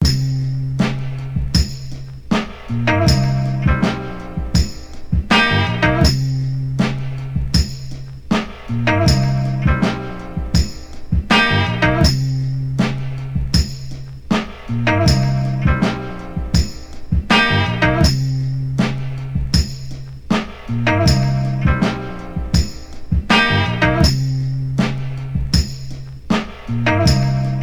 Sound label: Funk